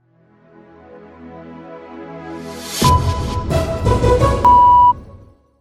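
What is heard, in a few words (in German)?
Sound Logo mit Sound Bett 4 Töne; Soundlogos für Ihr Corporate Design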